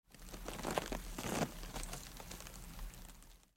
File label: Brazier; Blind Quest - The Frost Demon